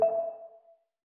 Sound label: receive message